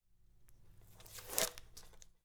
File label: Foley Knife Cut Slide Through Paper; Simple Nice India